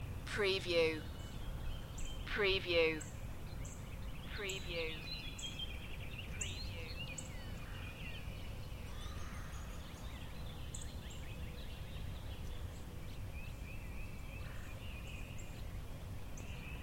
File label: Pretty Forest Birds Loop